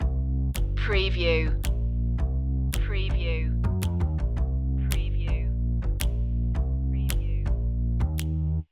lady swing loop

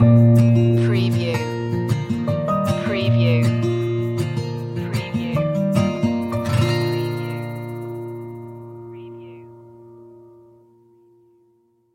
folk indie acoustic stinger

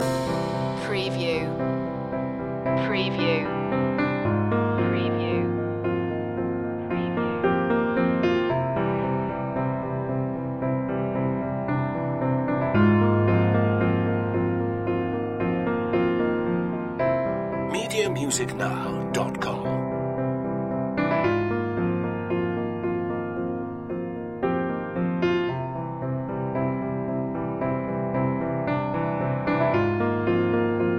danse loop

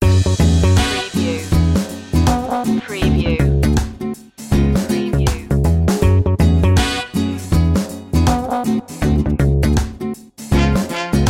spanish mood

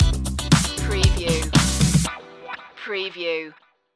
daft funk stinger

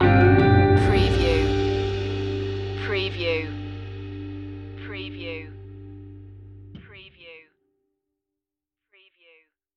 parish hall stinger

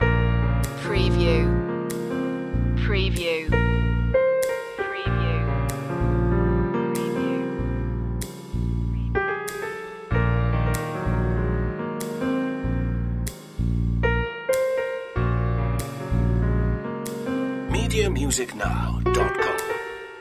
piano slow swing loop

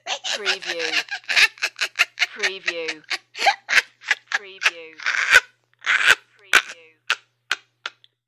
Woman Cracks Up

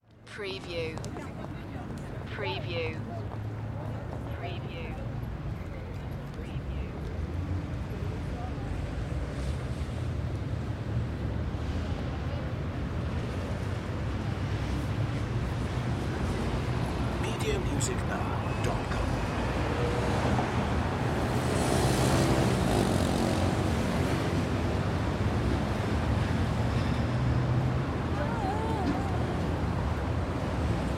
France City Street; sound-effects-bible

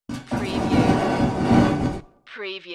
Stone Door

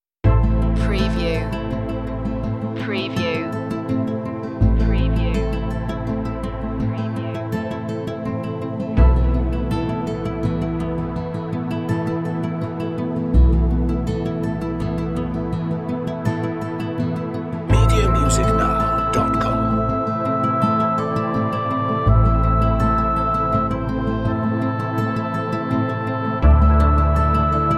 oboe in the woods

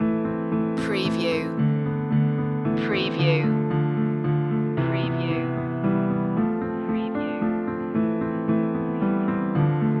a little motivational no melody

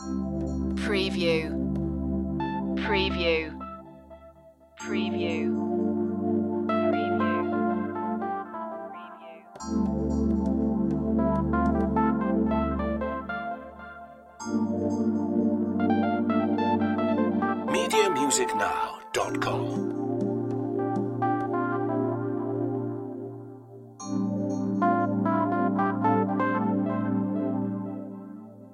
fusion break loop

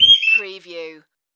Cukoo

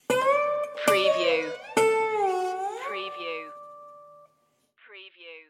starry western night stinger

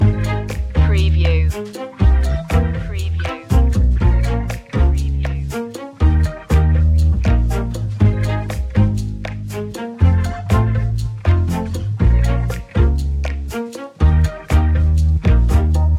funny mystery loop

